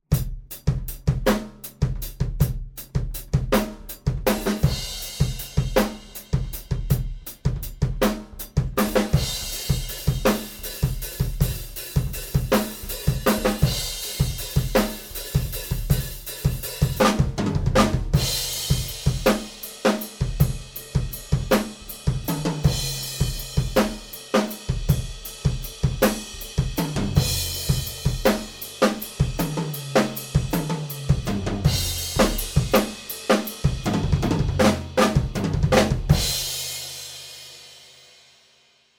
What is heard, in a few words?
Room Mics SCX25